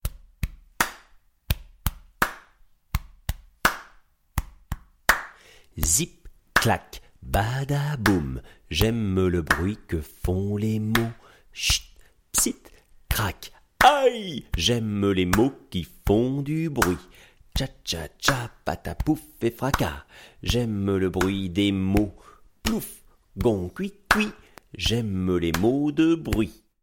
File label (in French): Zip-clac